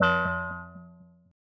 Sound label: 120bpm, Fm, Stab Chord